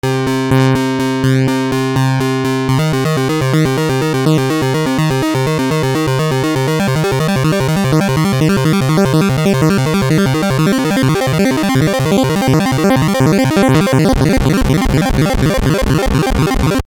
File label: ARP Poly; Europa